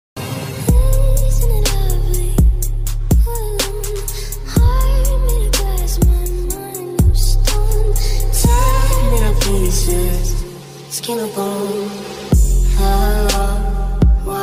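viral ringtone trending ringtone background music ringtone english ringtone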